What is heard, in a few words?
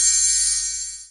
bell; conservatorio; Csound